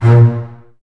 Basses A#2, String Ensembles; Miroslav Vitous; LINKS ORCH

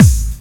Big Kick; EMU MoPhatt

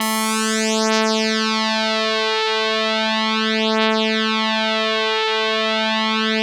ODYSSEY LEAD A3, Vintage Keyboards; Big Fish Audio - First Call Vintage Keyboards

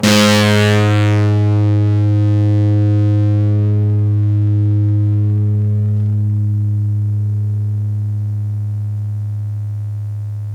Blues Pick G2, Blues Guitar

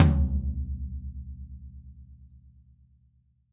DW Green Maple Tom; Sonic Foundry (Sony Creative Software); Vital Drums

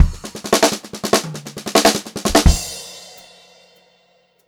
FILL, Pop-Rock Drumloops; USB Soundscan vol.37; AKAI; 96 POP

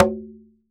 Drums Percussion Congas

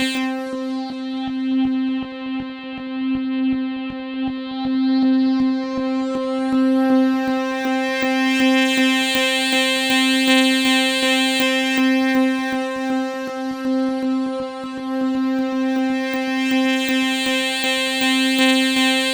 C4, SynthLine

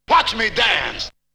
Vocal; ZERO G - Datafile DISC 2

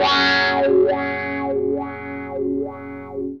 WAH AND C, Funk Gtr & Wah; PlatinumPhattCD2; E-MU Producer Series Vol. 8 – Platinum Phatt

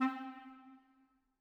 clarinet stac C4, Woodwinds; BBC

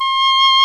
DOUBLE REEDS; OMI - Universe of Sounds

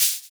Shaker; Equipped Music; Loungin House

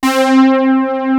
POLY SYN, KORG, Analog; USB Soundscan vol.49; Analog Fantasies; AKAI

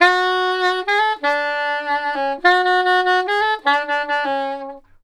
SAX, Balkanish Traditions; USB Soundscan vol.63; AKAI

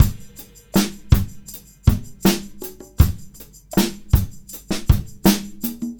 Combo, R&B Drums

